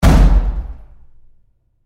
Door Bathroom Stall Close Roomy Very Hard; Bathroom Sound Effects; Big Room Sound

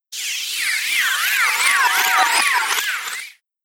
Space Zoom, whoosh; sound design

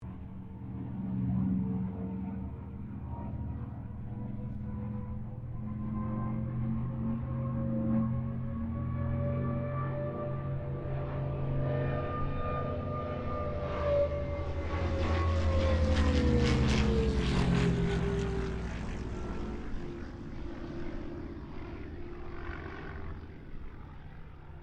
Kittyhawk Boomerang pass, Airplane; Mixed aircraft squadrons; Airplanes (Sound Effects:Vehicles)

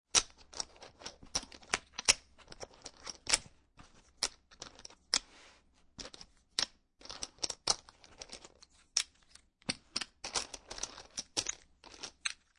Poker Chips Collecting From Table; Poker, gambling, chip; Chips clicking

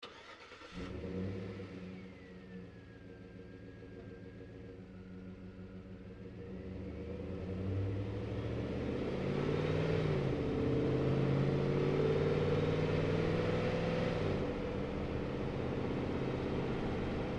Truck GMC Savana Onboard Drive Med; Vehicles

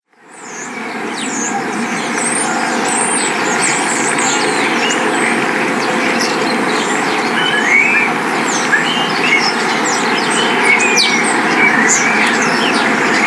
Bird Song In Spring Coastal Town Ambience; Outside Ambience Sound Effect

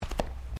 FOOTSTEPS THONGS (FLIPFLOPS) CARPET STEP; Footstep Foley; Footsteps (Sound Effects:Human)

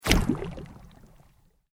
Sweep Organic Fast; Created sweeps, swooshes and other movement effects; Sweepers; Sound Design; SoundEffects-Foundation4

